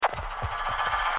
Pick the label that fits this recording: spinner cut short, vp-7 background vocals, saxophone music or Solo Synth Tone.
spinner cut short